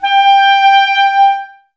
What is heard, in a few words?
clarinets, Wood Winds